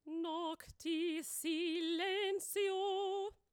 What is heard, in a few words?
choir